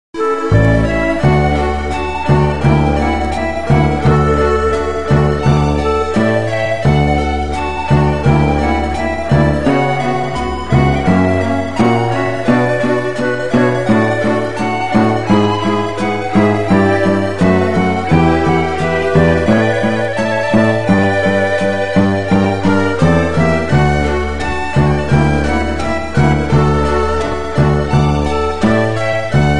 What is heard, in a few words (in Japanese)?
RPG風ファンタジー編 町; power pro kun pocket 4